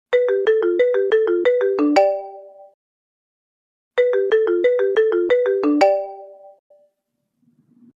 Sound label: marimba